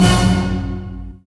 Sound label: HIT ORCH.; Roland LCDP08 Symphony Orchestra